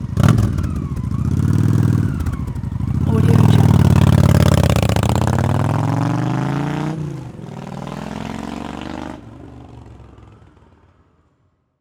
honda shadow rev takeoff; 2001; soundmorph

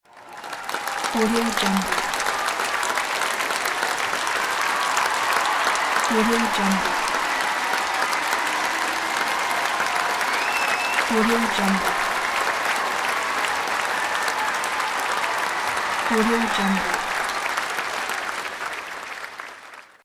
applause

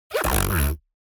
human mouth beatbox tongue roll hard; smartsoundfx

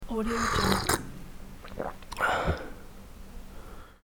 drink coffee

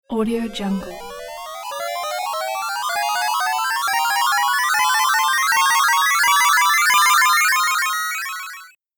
8bit crazy warp

